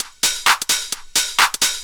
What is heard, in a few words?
drum 130, techno trance drumloops